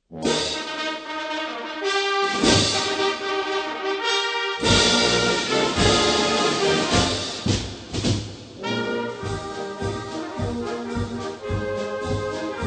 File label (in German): Garde Marsch